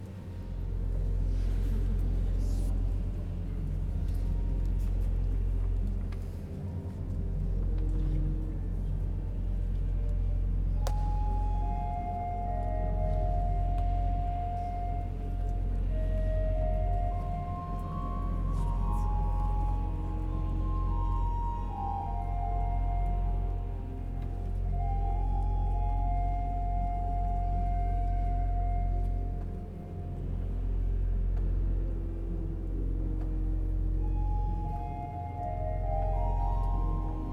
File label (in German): Orgelvorspiel, St. Thomas; Straßburg